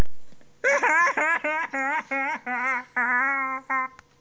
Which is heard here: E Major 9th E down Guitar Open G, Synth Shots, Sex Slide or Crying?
Crying